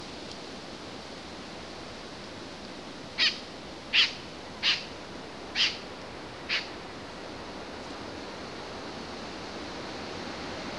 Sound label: Swintail Snipe four calls, nocturnal flight call; Lingshan; Beijing; nocturnal flight calls (NFCs)